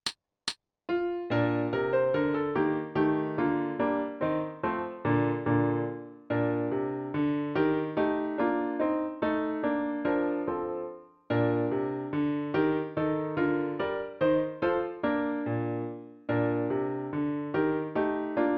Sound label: Menuet Full Tempo Accomp Only; Mozart; F Horn